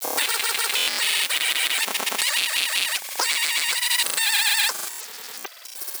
Vox, Circuitbend; 99Sounds; Mangling Audio

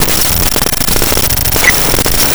Rhythm

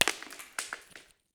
shatter ice; 3maze; IMPACTUS

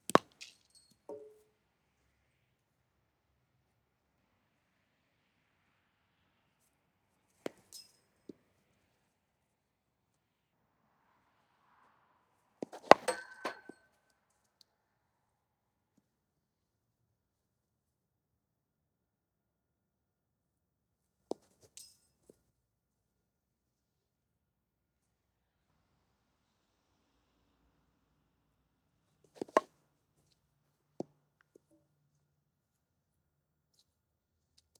Cricket - Junior & Senior; TheWorkRoom Audio Post

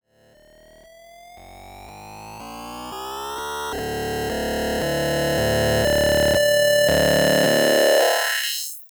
Textures; 99Sounds; The Weird Side Samples

